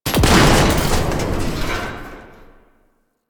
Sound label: Rail Mech Explosion; ambience; Warframe; Entrati